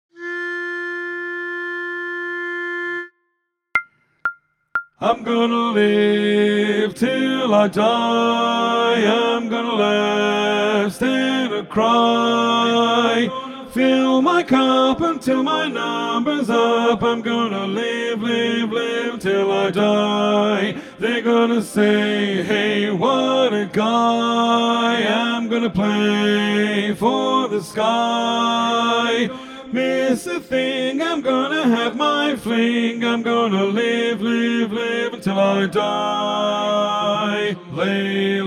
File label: bari loudest